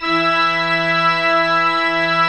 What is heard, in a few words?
MANUAL E3, Pipe Organ; E-MU Producer Series Vol. 2 – More Studio Essentials; Keyboards